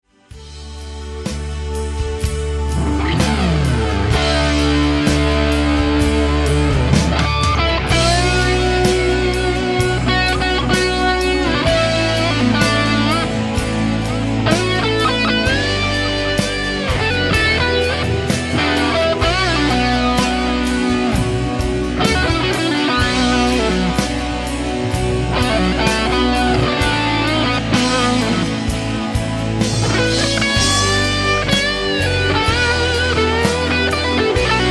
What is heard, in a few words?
solo